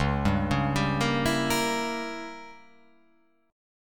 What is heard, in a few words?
C sharp Diminished 7th C sharp down